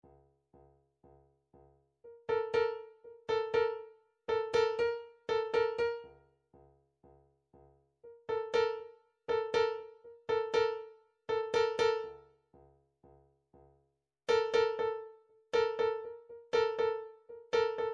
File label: drum trax shak